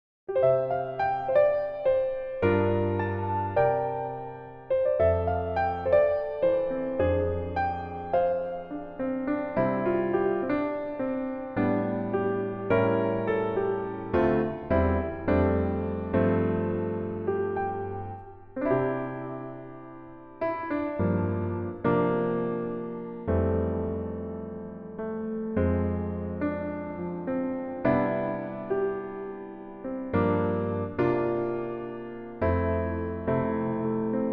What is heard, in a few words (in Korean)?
Piano -1키